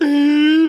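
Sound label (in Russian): вдыхают воздух при смехе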